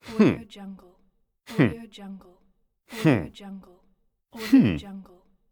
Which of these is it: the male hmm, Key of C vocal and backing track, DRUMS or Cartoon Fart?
the male hmm